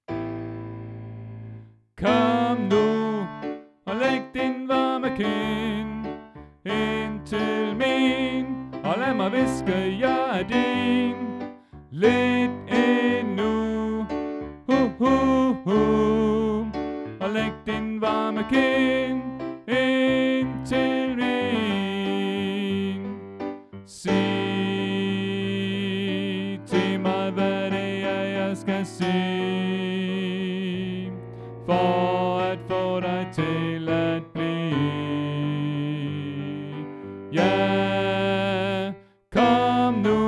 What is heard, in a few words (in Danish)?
Tenor; oevefiler